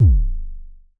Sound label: Mild Thump Kicks G0, Vermona Kick Lancet; LookIMadeAThing Kicks; Mild Thump Kick - Precise